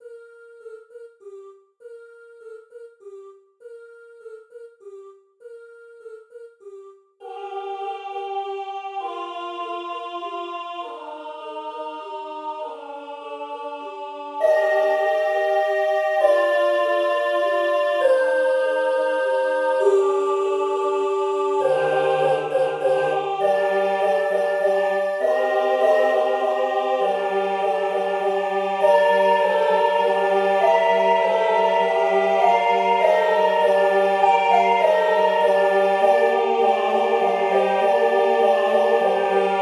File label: Carol of the Bells alt; practice music